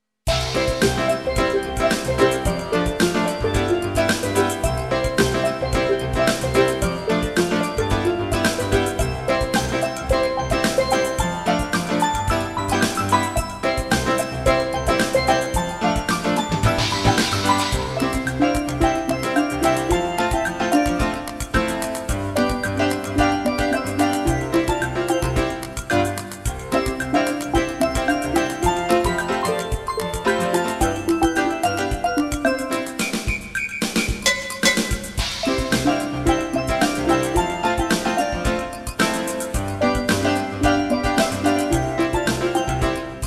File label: Samba; Samba la Sombrero